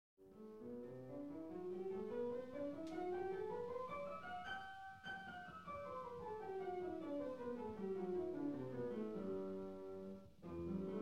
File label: piano